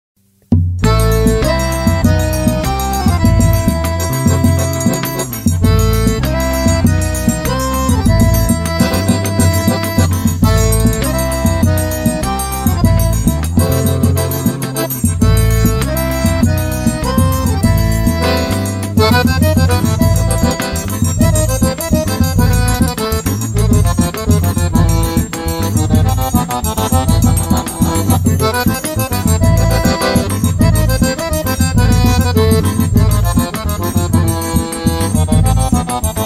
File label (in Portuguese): Forró